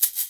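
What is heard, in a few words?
SHAKER, Percussion; InVision Interactive; Percussion Slam; PROGFROG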